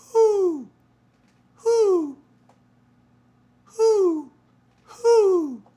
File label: Owl